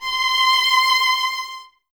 String Ensembles, Violas; Miroslav Vitous; LINKS ORCH

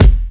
KICK, Big Beat; Big Beat Samples & More; AKAI

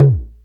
TALKING DRUM 2

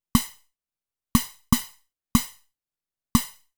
Anabeat Snare; Sonic Foundry (Sony Creative Software); Prototechno

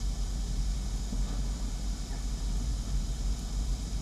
Ambience Loop, outdoor

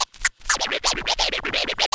FX scratch

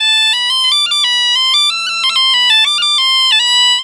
AM BPB, Celtic Flavours, BAGPIP090; USB Soundscan vol.42; AKAI

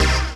HOUSE, House Dance

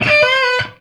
CLICHE H, HEAVY METAL; Greytsounds

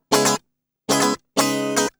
GUI 120 5aC#; Best Service Gigapack 2

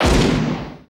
GSHOT, LA GUNSHO; Total Control - Action Cutz

